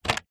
leisure toy car electric race track car down on track